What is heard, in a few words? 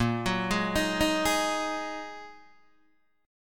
A sharp 7th Suspended 4th Sharp 5th, Guitar, DADGAD